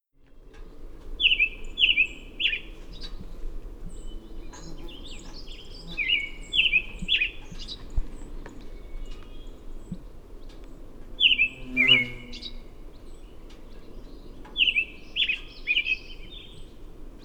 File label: American Robin Turdus migratorius